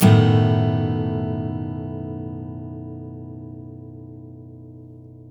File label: Blues Guitar, BARI CHORDS; ILIO; Fretworks